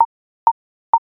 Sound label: click primary, ui sounds; mikroconsult